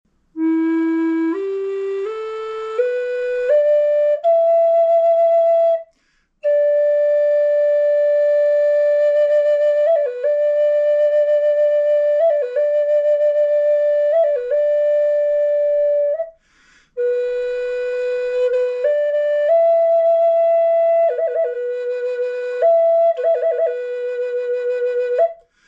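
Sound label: natural, flute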